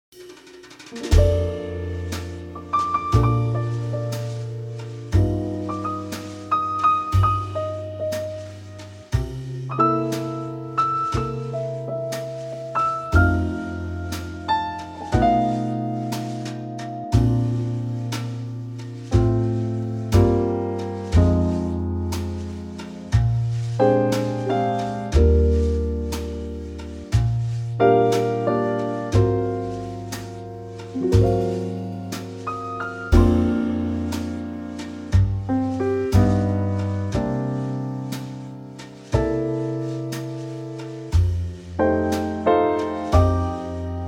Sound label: Trio Bb; key - Bb - vocal range - Gb to A